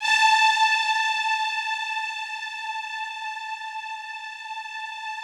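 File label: Yamaha VP-1, rough strings A4; Music Store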